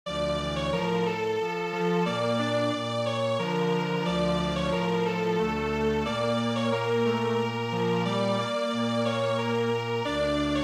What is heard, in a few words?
Strings Quartet, traditional; EiAman